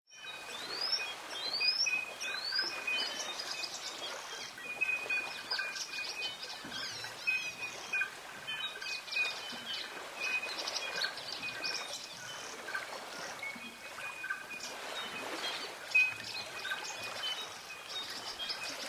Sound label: Birdsong Chorus